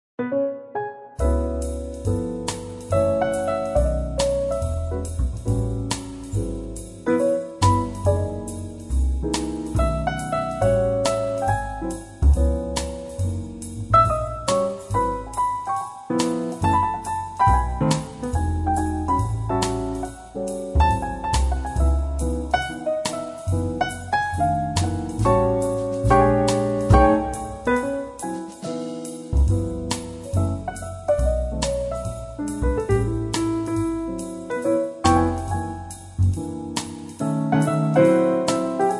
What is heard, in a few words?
bgm game; Helloween